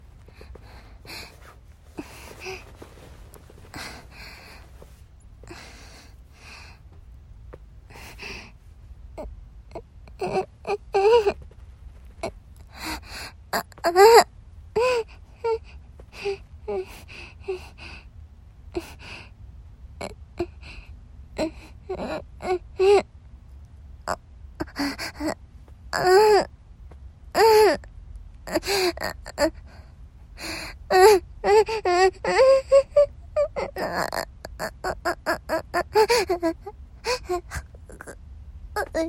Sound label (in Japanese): 18歳 喘ぎ声